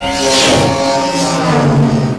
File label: speeder